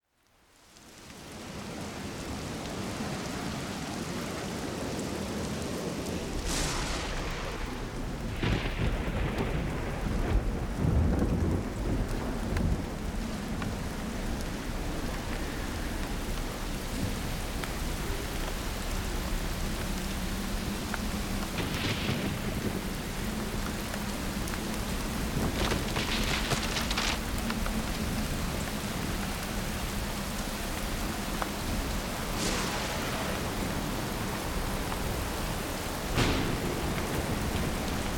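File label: thunderstorm sounds; zvuki grozy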